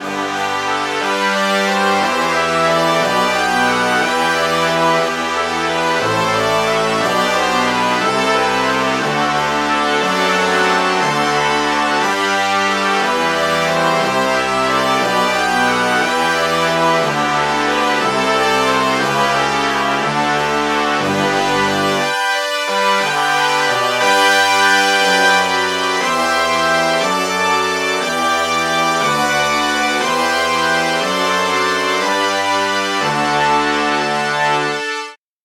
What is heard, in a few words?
brass section